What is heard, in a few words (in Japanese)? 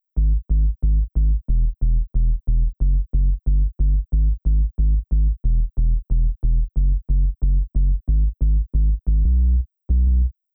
Sub Bass, 200Hz辺りまでを担当する極低音パート; Vital; 生楽器でいうコントラバスでしょうか。; 低すぎて小さいスピーカーなどではほぼ聴こえないと思います。